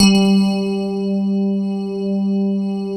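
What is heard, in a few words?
Rhodes G2, right; East West - Phat & Phunky CD2; 90's Sample CDs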